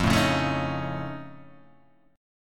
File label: F Augmented 7th F Guitar Half Step Down, chord